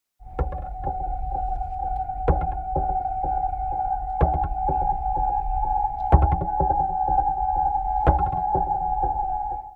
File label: harp dyn rythm